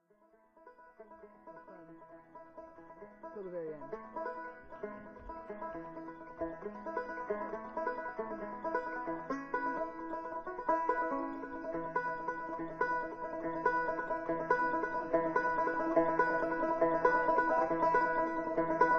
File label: Banjo Solo; Rainbow Family Circus Tribal Zoo